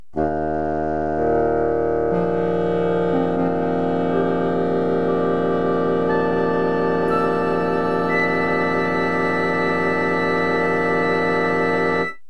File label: fifths from Eb